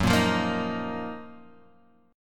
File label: Gb Major 13th, down, Guitar, Drop D